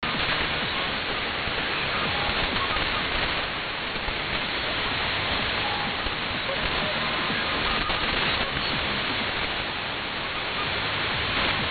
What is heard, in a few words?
Liquid Radio 6925am